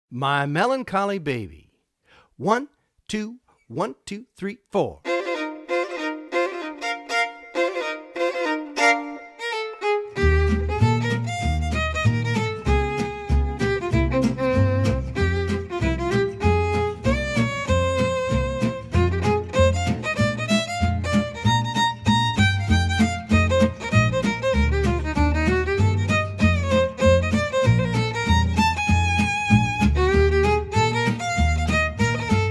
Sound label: Solo Regular, swing jazz